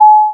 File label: alert